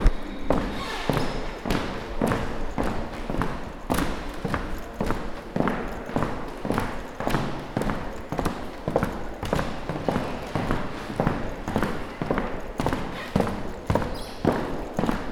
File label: Steps On Wooden Floor Candelaria Baluarte Cadiz Spain